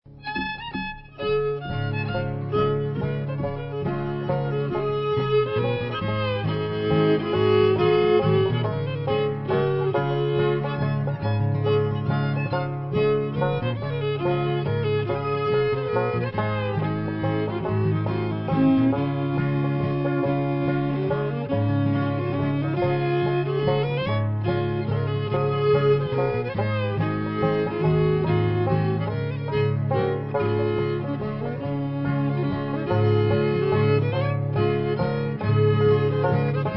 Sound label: Waltz